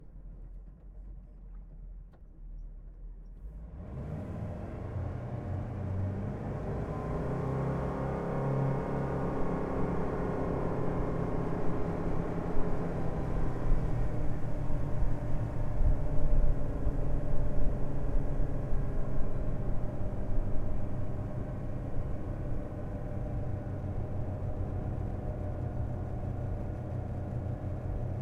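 Scarab 34 onboard startup blips fast accels shutdown floorboard side, Wellcraft Scarab 34; Pole Position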